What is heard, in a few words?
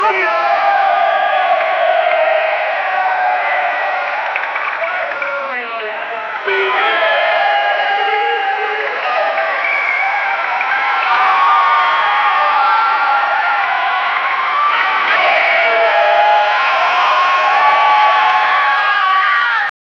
a crowd of enthusiastic v, audience